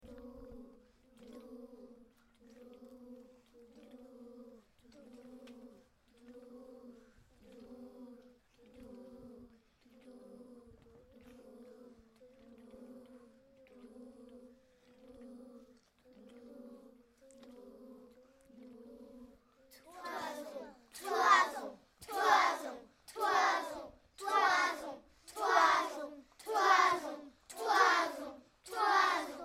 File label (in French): l angoisse des moutons pendant la tonte